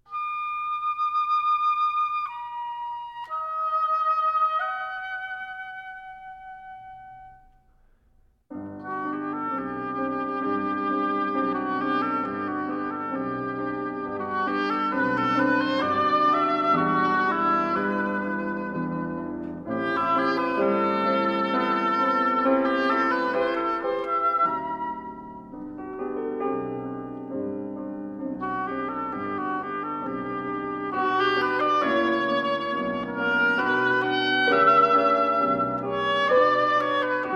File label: Oboe piano